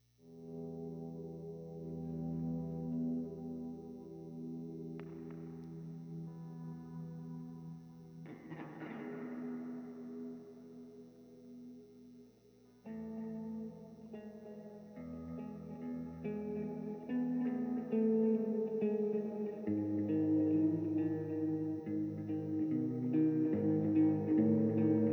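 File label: SM57 mono Guitar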